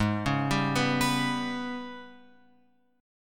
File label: G sharp Minor Major 7th Double Flat 5th; G#mM7bb5 Chord {4 4 5 4 X 7} arpeggiated